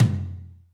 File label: Tom Jazz Mid; FPC; RadioactiveSandwich - Homunculus